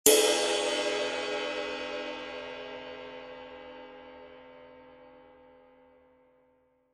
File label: 20in Custom Ping Ride, cymbal sound